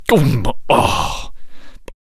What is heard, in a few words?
Gatling Gun Death Vocals